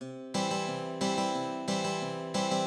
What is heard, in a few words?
Carter-style lick